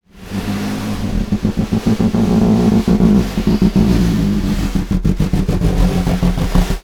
Snare Drum; Percussion Samples

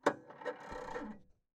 PayPhone

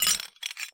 Hole Saw Rattle, Hole Saw Bits

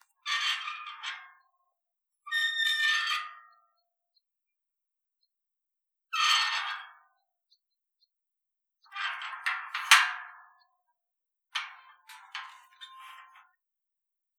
Squeaky Gate

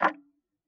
Tick, Clocks Kitchen Clock

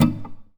Classical Guitar Damped Pluck; Classical Guitar Extended Technique Stereo

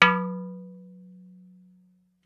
Flower Pot Soft

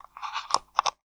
Taxi Meter

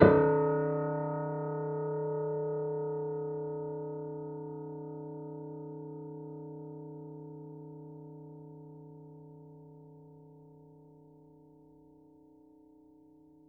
Piano Harmonics, Harm Low Mid

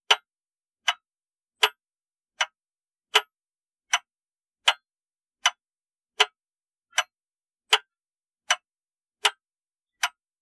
Antique Clock Ticks; Clocks

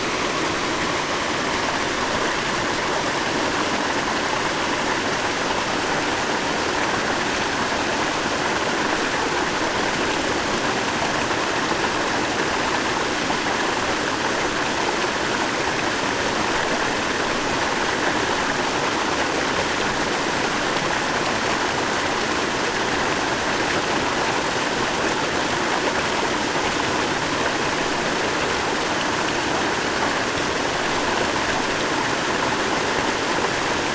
River, Static Microphone

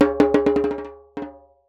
Jembe Bounce; Percussion Samples

